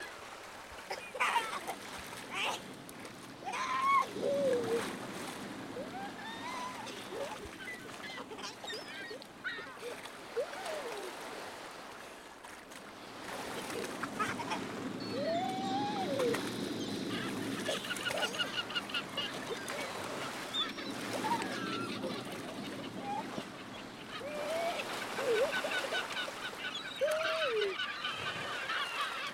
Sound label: The Cool Ocean Children Airplane Jet